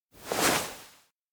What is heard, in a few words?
turn back; anemoi